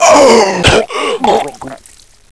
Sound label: player drowned